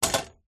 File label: industrial metal caulk gun down on wood surface